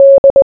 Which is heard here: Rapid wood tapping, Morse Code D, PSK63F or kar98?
Morse Code D